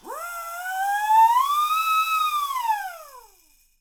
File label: SIREN; Miroslav Vitous - Percussion & Harp